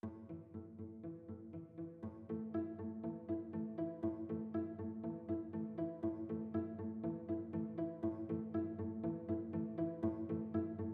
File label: rhythms